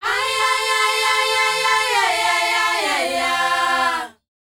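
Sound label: "AYEYAYA", World Voices South African; ILIO; Vocal Planet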